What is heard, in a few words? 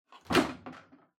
Washer Front Load Filter Upper Remove Strong